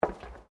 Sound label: FOOTSTEPS WOMENS LOW HEELS ESCALATOR STEP; Footstep Foley; Human